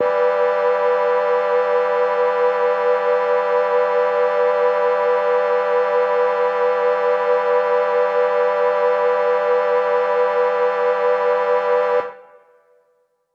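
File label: Mixed Winds E minor 7, synth orchestra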